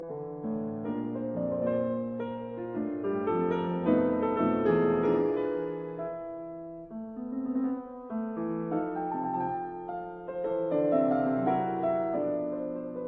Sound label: Mazurkas in B minor Tempo giusto; 10 Mazurkas Op 3 No 1